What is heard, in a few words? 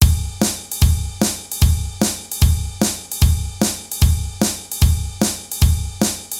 drums